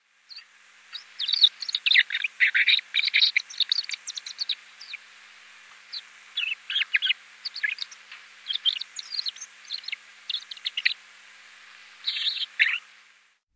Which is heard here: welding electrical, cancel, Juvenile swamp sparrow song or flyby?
Juvenile swamp sparrow song